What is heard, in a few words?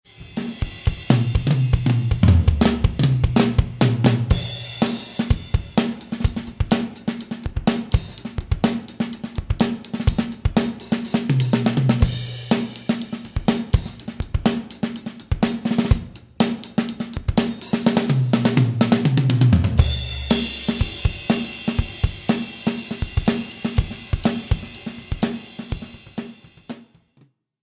drums